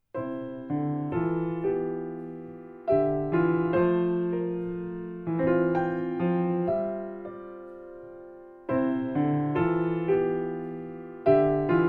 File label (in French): Choral Ut M. Tendrement